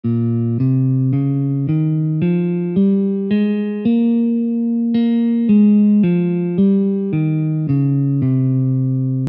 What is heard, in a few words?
scale; scales of India